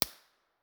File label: koli snow, koli national park winter